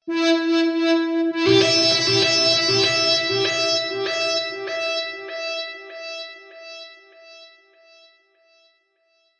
Synth Element Em 98, Synth Loop In E Minor At 98Bpm; Synth Loops; Synth Element2 Loop Em 98Bpm